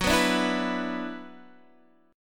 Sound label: F sharp 7th Suspended 4th Sharp 5th, Guitar, DADGAD